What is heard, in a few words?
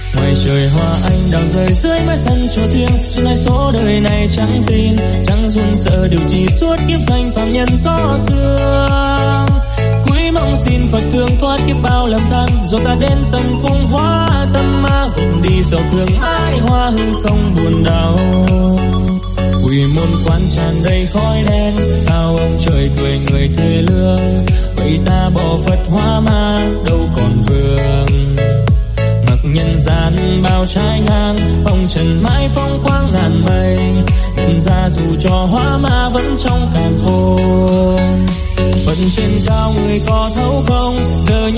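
ringtone remix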